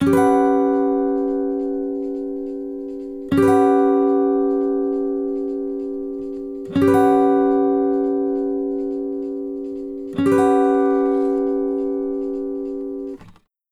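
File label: Country Guitar Intro; Digidesign; Pro Tools Essential Loops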